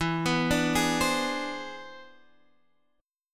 E 7th Flat 13th; E7b13 arpeggiated; JGuitar

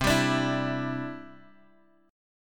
C Major Add 9th C down Guitar Drop D

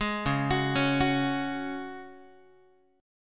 C sharp Suspended 4th C sharp Guitar, chord; arpeggiated; 11,9,9,11,10,x; 4A,4E,5A,5D,5G#,6C#

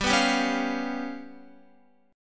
G 7th Flat 5th G, Guitar; strummed; JGuitar

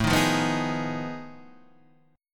F 7th Sharp 9th Flat 5th A

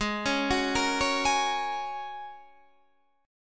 G sharp 9th Suspended 4th, Guitar, Open D

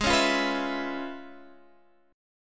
C sharp 7th Sharp 9th G sharp

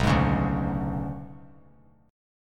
B Minor 6th Add 9th B down 3C 3F 3A 3D; Bm6add9 strummed; JGuitar

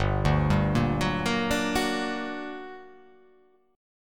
G Minor Major 7th G; chordshape; down

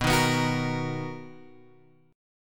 B Major 7th, B, down, Guitar Open G

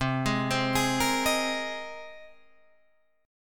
C Minor 7th Sharp 5th C Guitar DADGAD

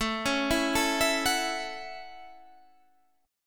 A Major Add 13th A, chord

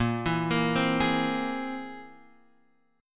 C Augmented 7th Bb

